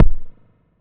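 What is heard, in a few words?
kick, ARP 2600 Drums; Polyend; Simulacrum Sounds; 1 Shots; Synths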